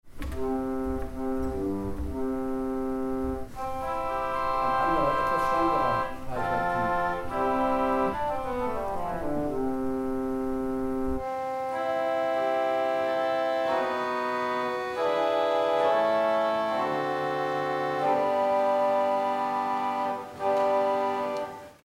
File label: Viol d'orchestre, orgel